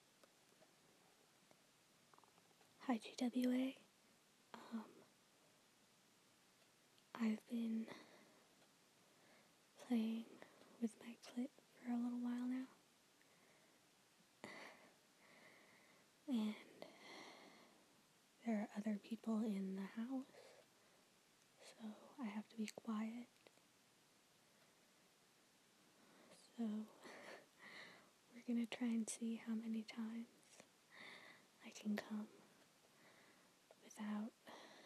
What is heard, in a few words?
Lots of Whimpers, F4A; Quiet Time; I tried REALLY hard to be quiet, I swear!; Other Squeaky Noises